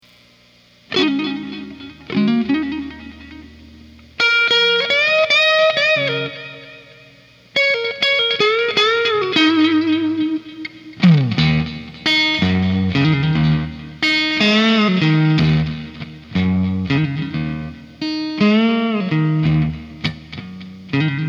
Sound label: strat raw marshall creamback